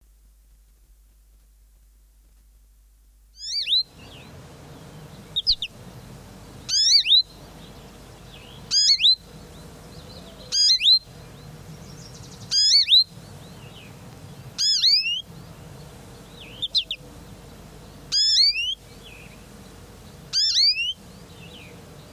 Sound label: american goldfinch, songbirds